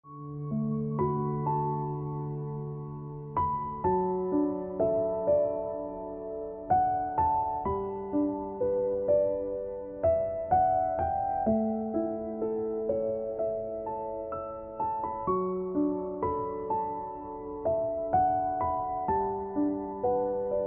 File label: Piano Music